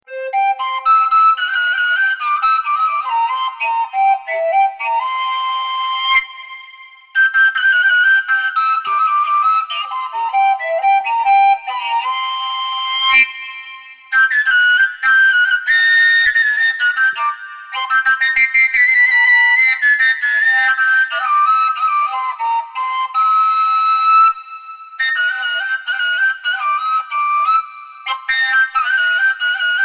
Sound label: overtone short; 指穴が一つもない笛です。; コンチョーヴカ（LowＣ）を吹いてみました; LowＣ管